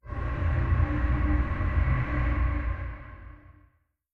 distance perdition beam fire; apocalypse

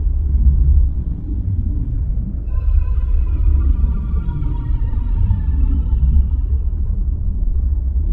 dimensional horror portal; Stellaris; guardians

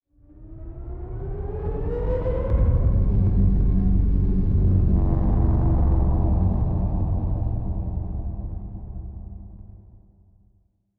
ship titan move; Stellaris; shroud; ships

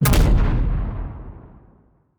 kaiju lightning hit explosion; Stellaris; biogenesis; weapons

